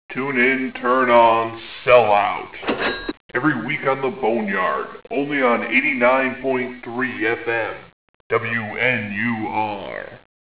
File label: turn on